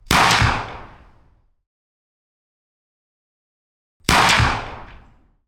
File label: L110A2 LMG 5.56mm belt fed 50m behind clean Single shots; FN MINIMI; Schoeps